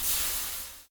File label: fire extinguish; fake fire; homedecor modpack